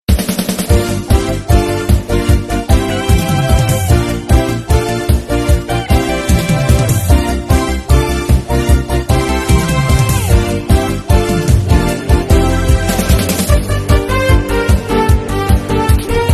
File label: theme; tiga